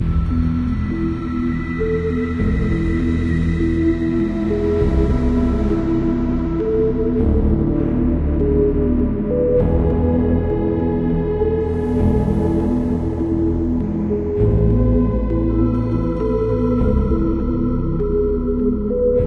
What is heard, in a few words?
login screen